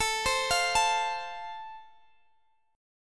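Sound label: A Minor Sharp 5th, Guitar Open G, chord strum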